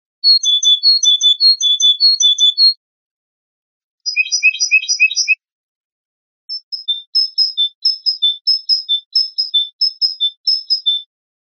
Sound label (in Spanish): falta primavera carbonero herrerillo